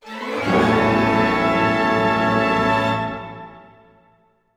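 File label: orchestra; Masterbits - Switch FX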